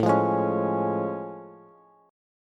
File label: A Augmented Major 7th A Bass; strummed; JGuitar